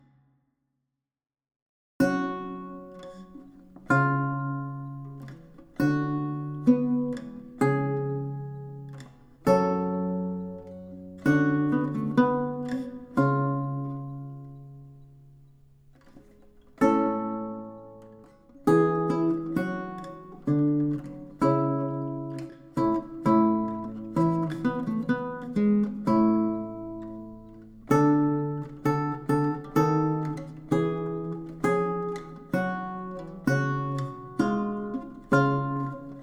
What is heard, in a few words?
lute; adoramus te christe